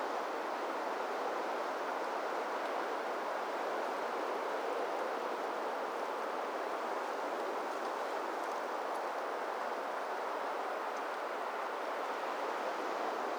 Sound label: exterior; soundscapes